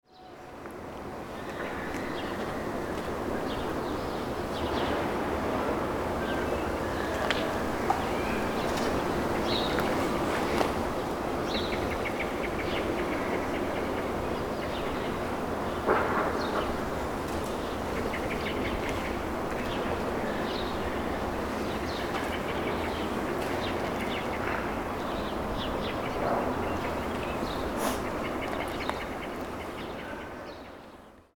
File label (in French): Jardin SEGPA